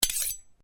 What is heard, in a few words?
Blade Swish, Sword